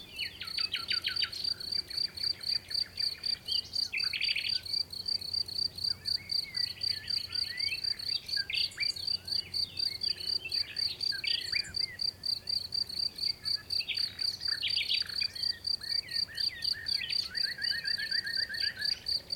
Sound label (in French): Grillons oiseaux; MONTRICOUX